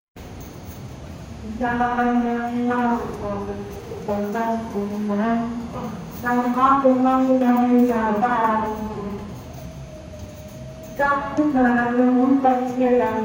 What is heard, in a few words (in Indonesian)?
kereta uap kuno perlahan, steam